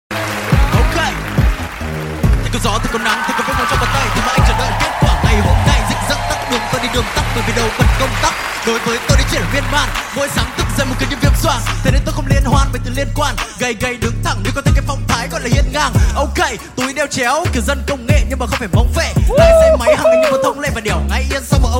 Nhac Chuong Rap Viet TikTok

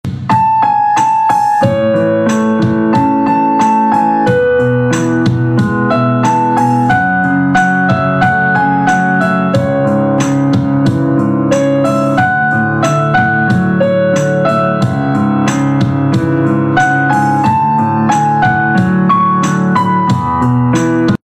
piano nhạc hoa

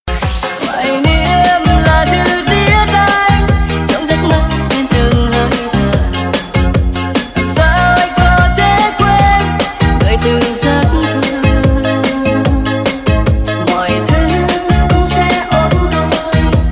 Nhac Chuong Nhac Song

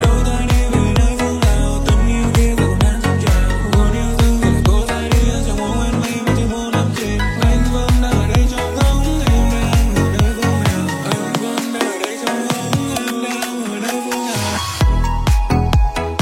nhac chuong remix

